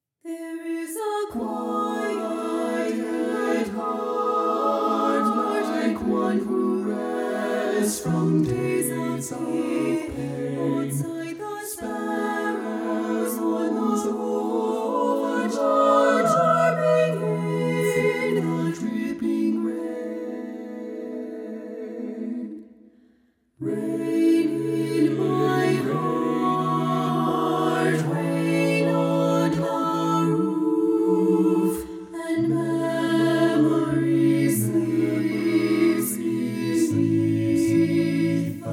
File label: Soprano II Left